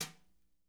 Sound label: BRSNR, drum